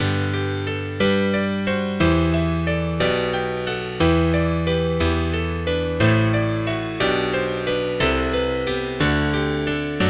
honky tonk

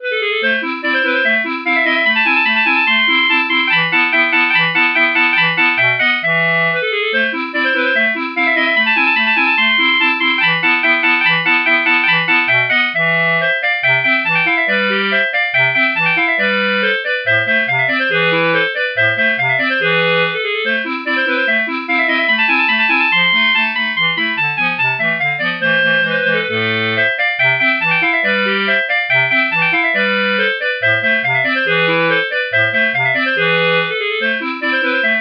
clarinet

